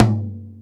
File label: REMOTOM; Best Service Advanced Media Trax Modern Composer